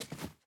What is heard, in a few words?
Step Marble; MiSide